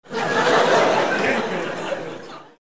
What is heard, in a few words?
laugh